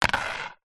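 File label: leisure frisbee plastic land wood surface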